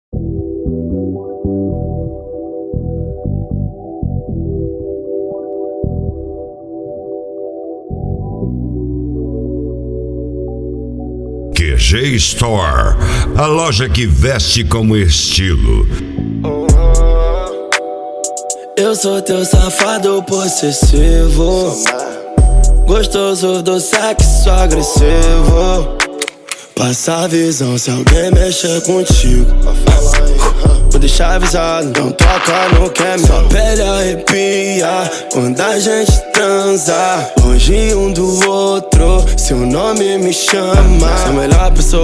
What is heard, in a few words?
trap